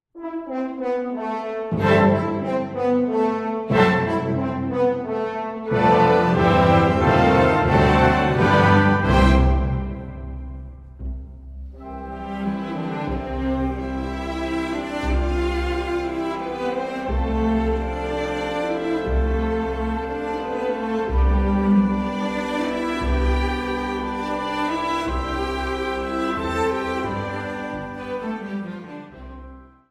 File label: piano